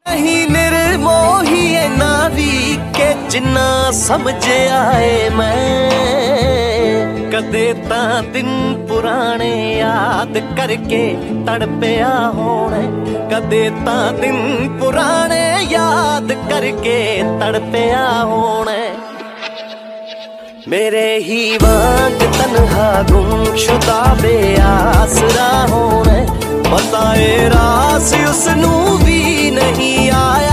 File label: Punjabi Song Ringtone